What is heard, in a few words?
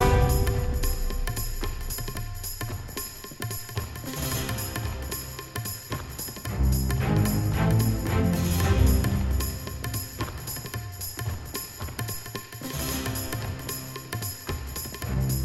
Woodburrow Canopy (Arrival and Leaving)